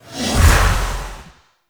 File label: Temporal Drag Cast; Warframe; Focus; Zenurik